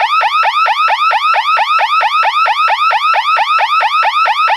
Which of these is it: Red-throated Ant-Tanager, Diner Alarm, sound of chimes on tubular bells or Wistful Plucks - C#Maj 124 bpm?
Diner Alarm